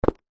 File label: foley footstep single football boot on concrete